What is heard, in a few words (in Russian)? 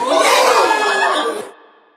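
хор вокал хай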